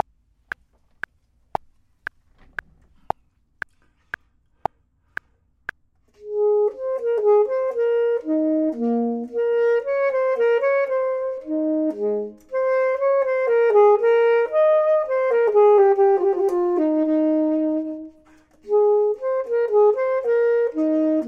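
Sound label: top, Saxophone and Clarinet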